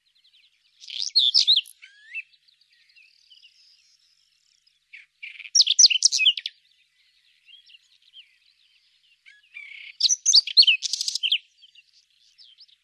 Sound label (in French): Tarier des pres, oiseaux; birds